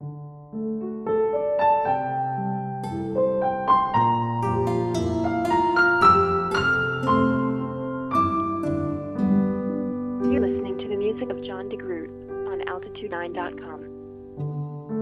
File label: Piano